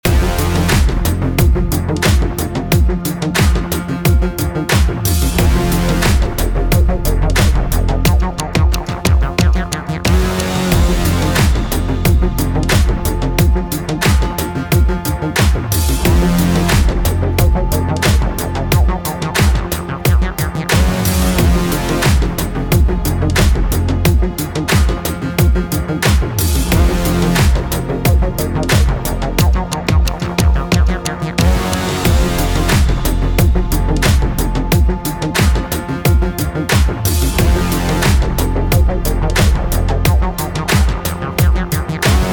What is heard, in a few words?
Cybernetic Combat Synthwave